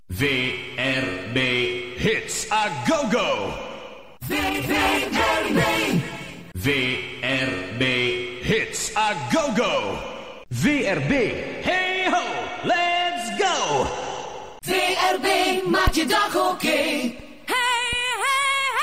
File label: jingles